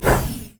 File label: ranged attack; LoL; jayce